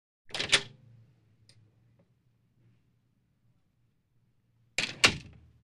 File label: Wooden door opens, closes